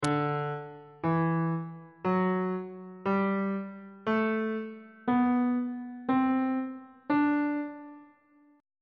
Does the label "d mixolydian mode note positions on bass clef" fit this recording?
Yes